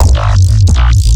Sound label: Growl Bass; neuro; BIG5AUDIO